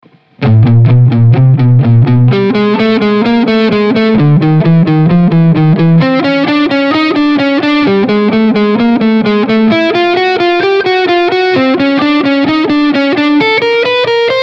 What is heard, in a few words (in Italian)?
Salto di una corda